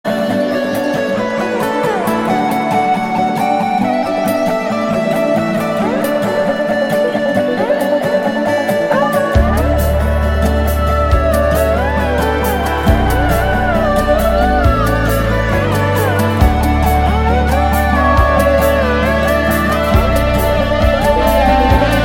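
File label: background music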